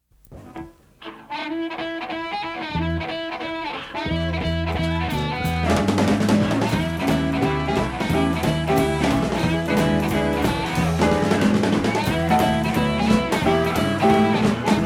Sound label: Harmonica Rockin